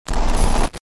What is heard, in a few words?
Error glitch